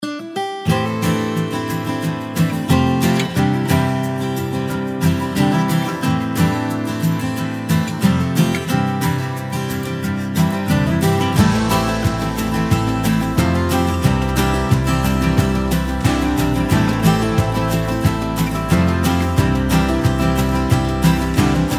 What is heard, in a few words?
Acoustic Indie Ensemble